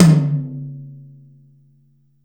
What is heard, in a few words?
tom, Percussion; realdrum